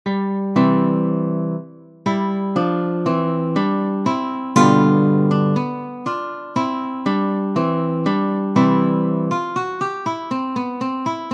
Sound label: Allegretto, guitar